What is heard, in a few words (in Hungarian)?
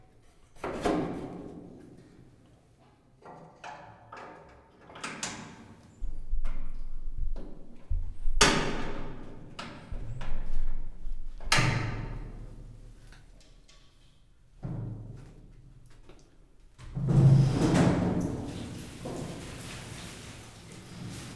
rácsozat, galléros pávián; pecsi zoo 2017